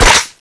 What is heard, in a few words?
clip out; tfa cso; m60craft